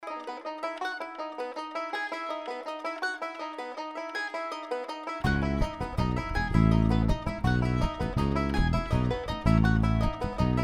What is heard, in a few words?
bluegrass